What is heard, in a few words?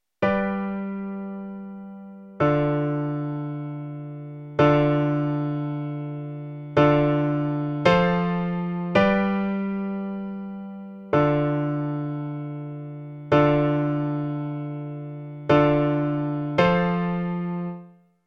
Middle 8 in C example